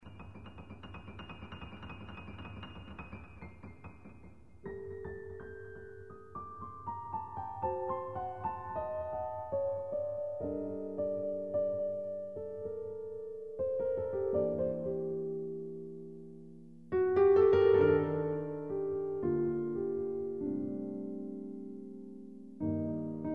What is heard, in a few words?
piano